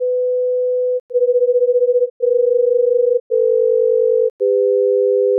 first 500 Hz then 500 + 485 then 500 + 475 then 500 + 450 and finally 500 + 400; All of the above tones are one second long at constant volume